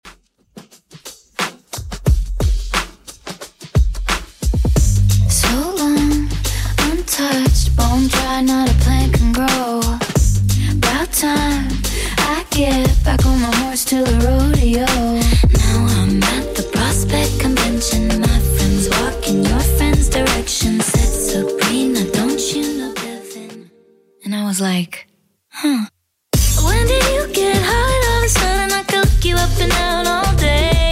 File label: Top40 Redrum 89bpm